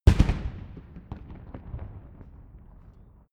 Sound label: rifle salute fire; comedy-cartoon; pistolen-gewehre